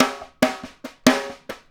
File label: snare pattern; Drums; Snare Patterns; Essential Percussion